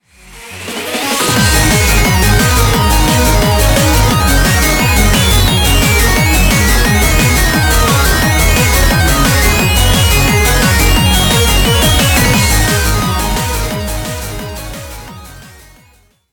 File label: BGM xfade; Invaxion